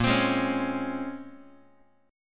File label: Bb Minor 9th Guitar, chord; Bbm9; strummed; x,6,3,6,5,x; JGuitar